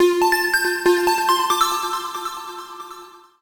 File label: TECHNO SYNTH 140; Keys